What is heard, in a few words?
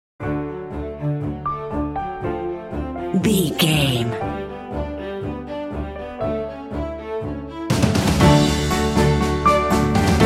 bumper